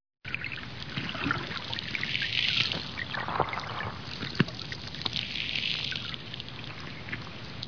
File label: wave